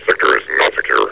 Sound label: laugh, hgrunt; scmod